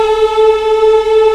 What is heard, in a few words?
African Mm p; Roland LCDP11 Africa VOL-1